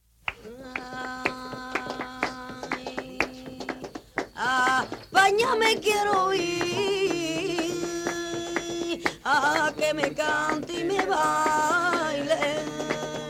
flamenco; 1951年から62年までに録音された音源を収録したフラメンコ・アンソロジー

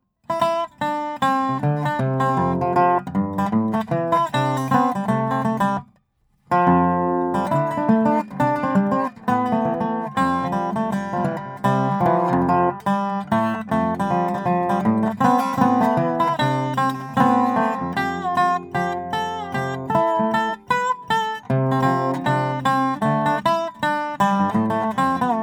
music of burma

